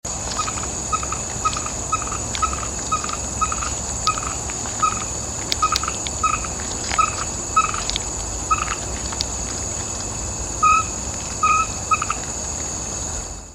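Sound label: fejervarya limnocharis, Field Frog call; West Coast, Singapore, 21 Jun 2017, 2030 hrs.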